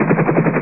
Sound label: HELOCOPTER; Wargame Construction Set v1.0; SSI